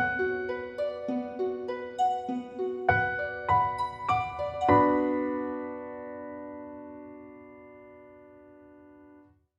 Sentimental Sad Instrumental

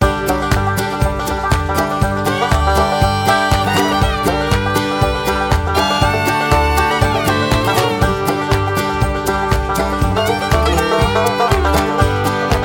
Folk Bluegrass; MIUI14 and HyperOS 1.0

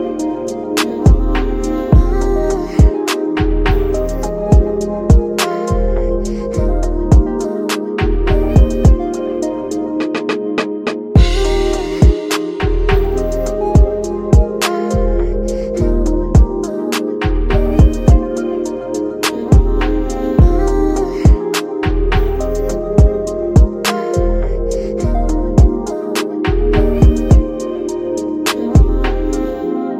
RnB beat alone, ringtone; MIUI12

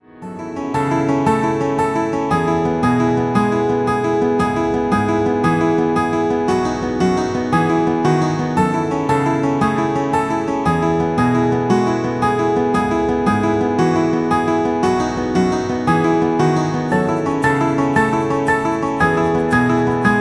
Happy and relaxed melody; MIUI12

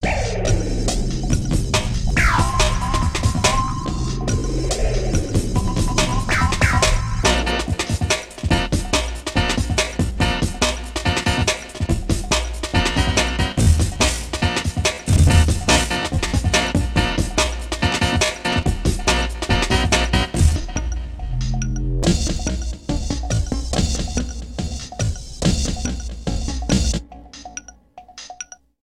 Acid Stomp; MIUI12

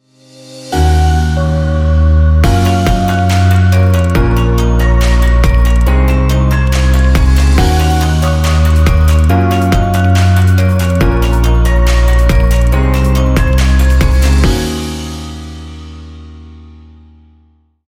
Technology Space Logo, ringtone; MIUI12

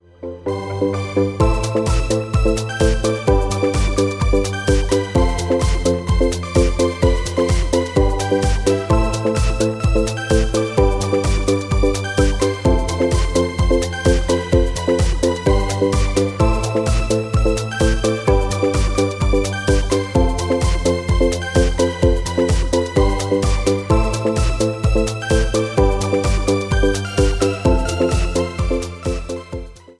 Respiratory flow electronics; MIUI13

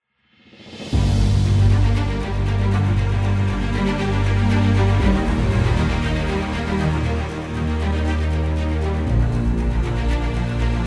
dramatic heroic, ringtone; MIUI14 and HyperOS 1.0

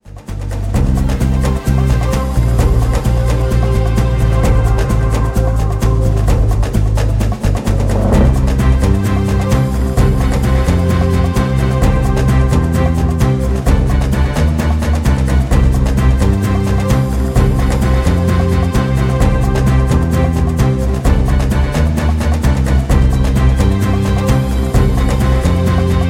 Despair; MIUI12

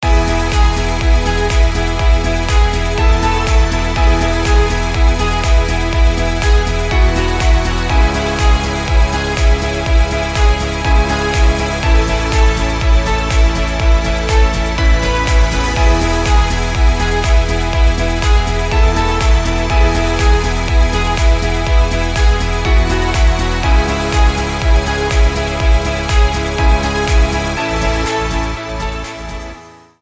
Advertising Music; MIUI12

